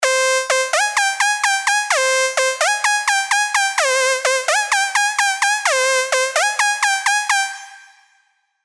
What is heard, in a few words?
Dutch Train, Sylenth1 dirty dutch leads; revealed sylenth1 dirty dutch leads vol 1